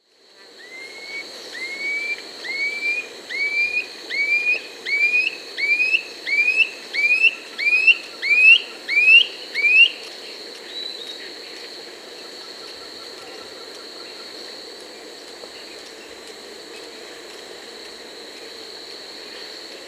Gray Antwren (Myrmotherula menetriesii), Peru, Birds of the Amazon; S. America